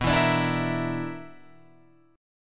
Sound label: B Minor Major 7th Double Flat 5th, Guitar, Open F; BmM7bb5 strummed; JGuitar